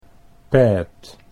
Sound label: pad